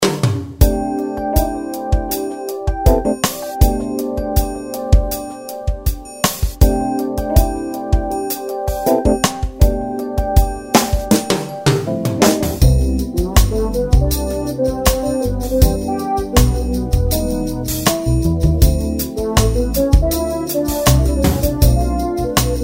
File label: BOSSA 080, drumloops